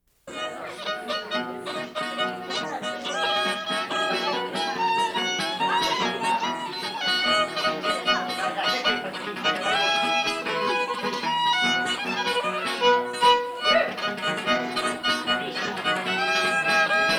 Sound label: violinist, guitarist, dance caller, Emilia, Trescone (Folkdance); Riolunato artisan; PIEDMONT, EMELIA, LOMBARDY; ALAN LOMAX IN NORDITALIA